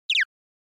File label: SNES Action Damage